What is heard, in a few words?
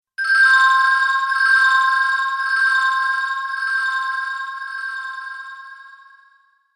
Rpg Magical Spell Ward Of Warning